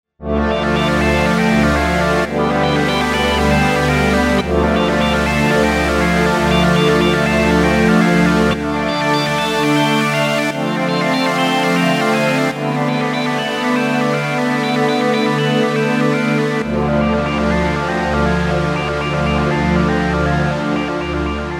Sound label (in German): Synthie Stuff